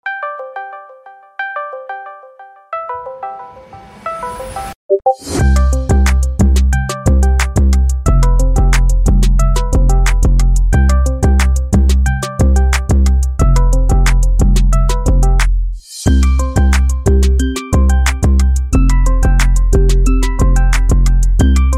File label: discord call